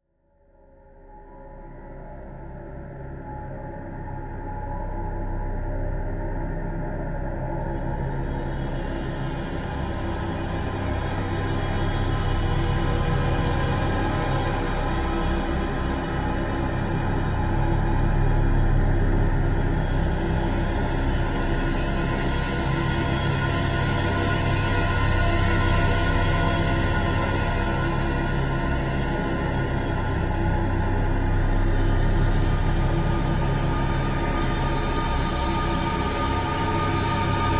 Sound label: Music Eerie Horror, choirs